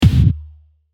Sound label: punch